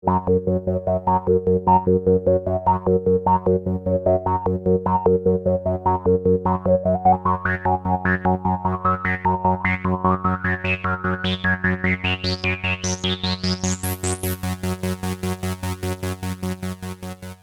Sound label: env pattern reso step